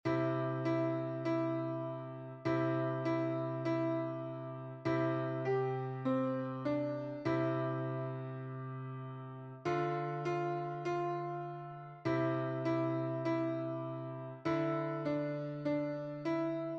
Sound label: Jingle Bells, piano